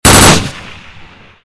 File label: g36 burst